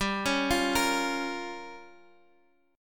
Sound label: G Minor 7th Flat 5th, Guitar, Open D; chordshape; down